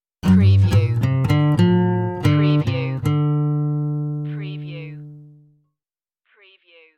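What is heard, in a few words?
Acoustic Blue Riff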